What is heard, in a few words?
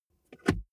car bmw e46 interior center console close soft